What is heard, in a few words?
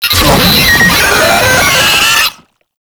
Attack, Vocals; Enemies; Warframe; Proto Infested; Brachiolyst